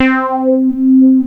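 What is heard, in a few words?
synth, 140 BPM, drum & bass; Ueberschall; Drum & Bass Resonance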